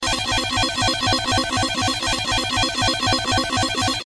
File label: printer alarm; nyxteam printer